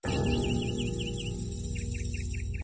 smooth sms

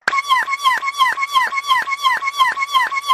eheheh

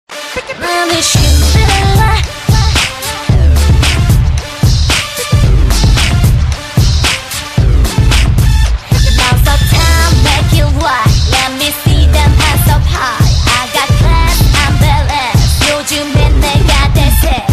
Hiphop

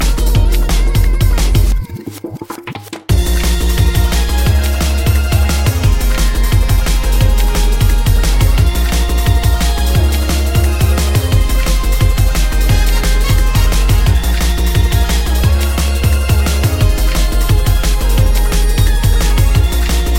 Drum And Bass Tone

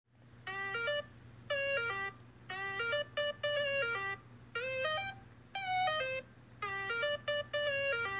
8bit; Peter Parker

